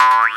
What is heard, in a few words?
Boing Jump